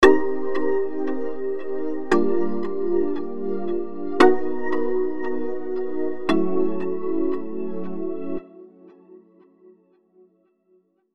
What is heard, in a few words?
polysynth with flanger comb and chorus